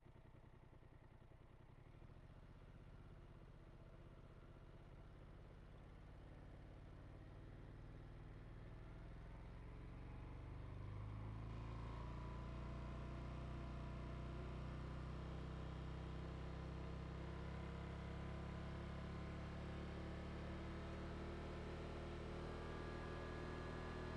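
polaris sportsman xp1000 onboard ramps drive stop off engine; mkh8020; Sonniss GDC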